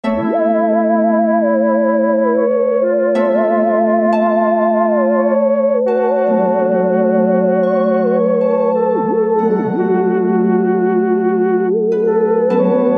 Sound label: outro music